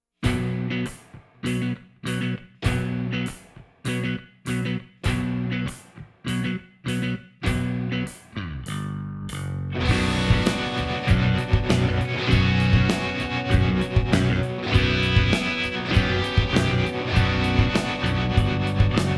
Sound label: Vocal No Doubles Mix